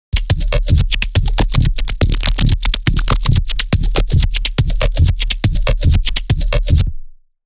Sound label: weird bass